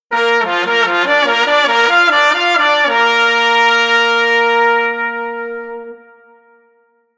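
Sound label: Horn Unison 2 Trumpets Trombone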